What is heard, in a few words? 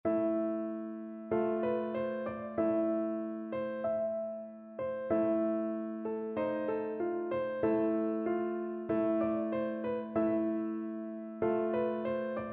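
electric piano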